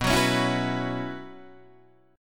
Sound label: B Suspended 2nd Suspended 4th, Guitar, Open D